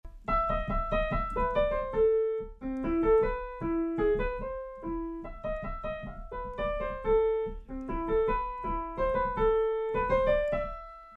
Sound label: piano test; Blue Yeti mikrofonu; Logitech